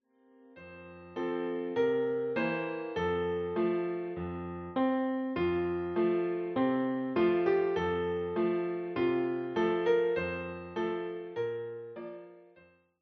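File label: piano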